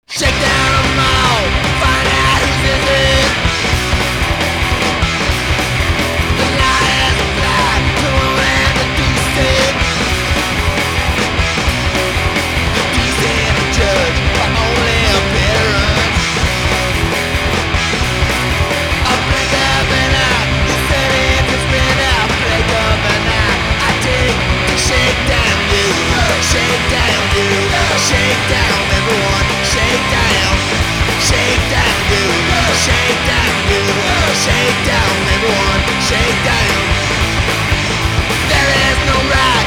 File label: Music Rock; Rolling; PS2; Music Rock R04